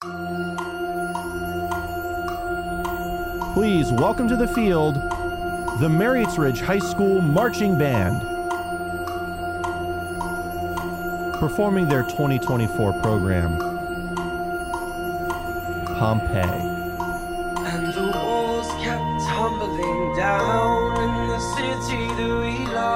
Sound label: Intro with Click and Samples